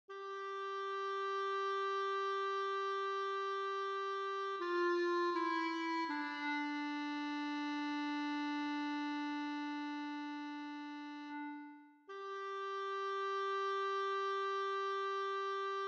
soprano

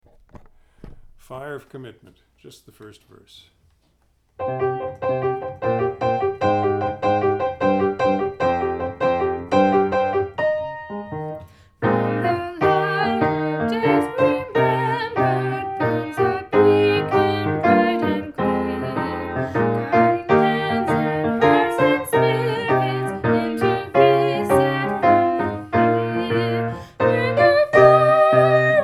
soprano

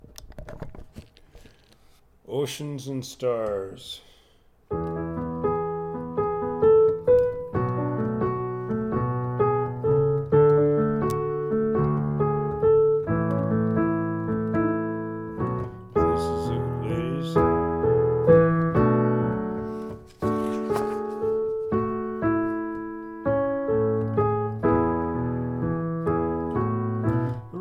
oceans and stars tenor, choir

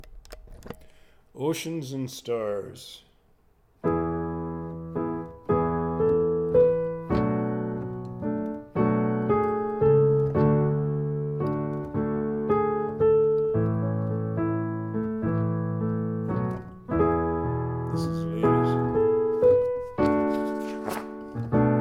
oceans and stars bass, choir

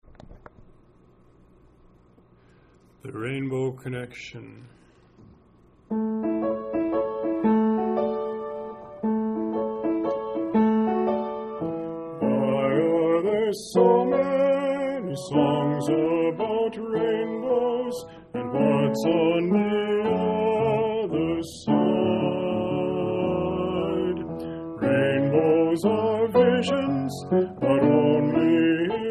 alto; choir

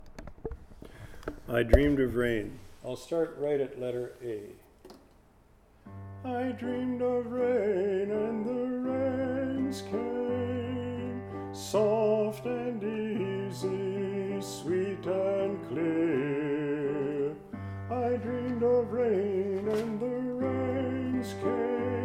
bass, choir

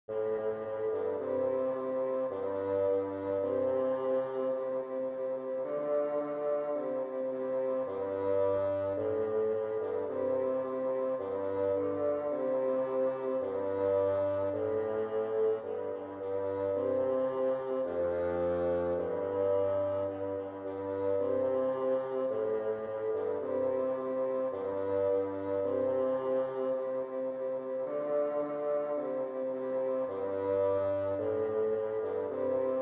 nkosi sikeleli afrika bass, choir

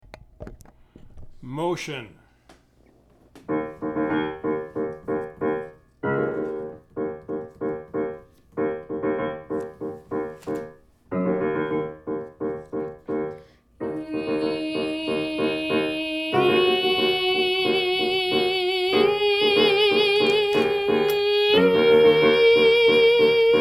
soprano

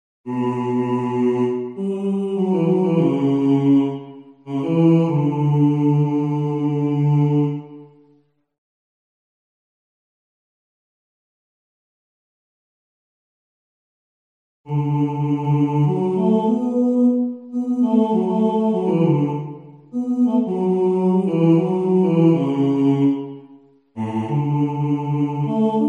vocals

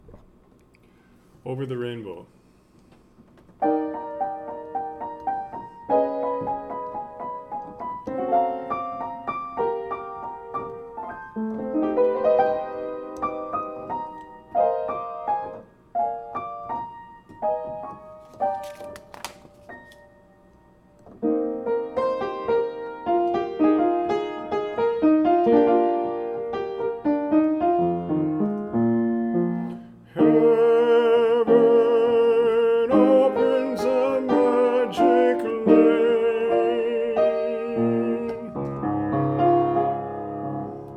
tenor, choir

